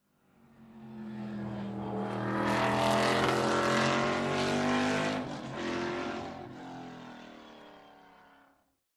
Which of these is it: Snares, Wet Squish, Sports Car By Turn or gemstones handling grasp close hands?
Sports Car By Turn